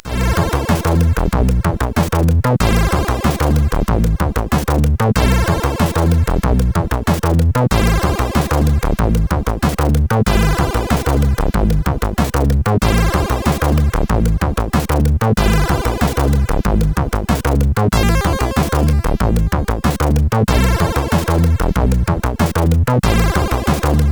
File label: Note Music, sid CSG8580R5